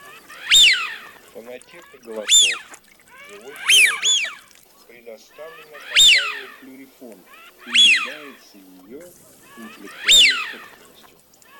Свиязь Fischione; plurifon

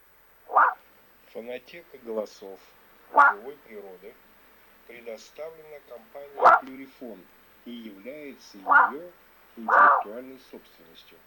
Лай лисы Volpe Abbaio; plurifon

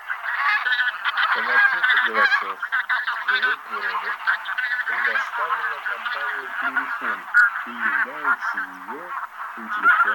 Гуменник базар Oche Granaiolle; chip mini 234 plurifon

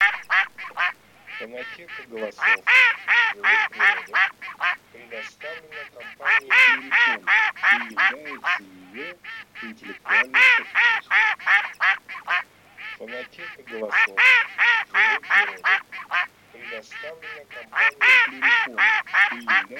Кряква Germano; plurifon

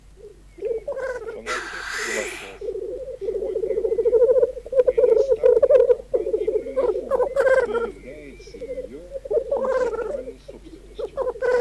Тетерев Forcello; plurifon

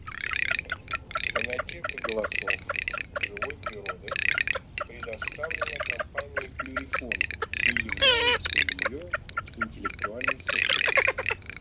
Чирок-трескунок Marzaiola; plurifon

